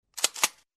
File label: bullet recharge